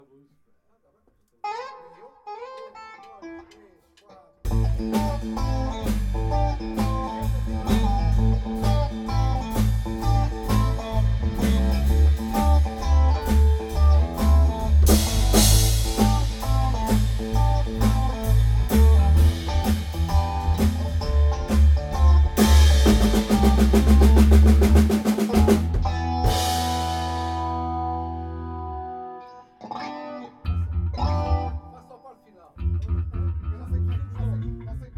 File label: Blues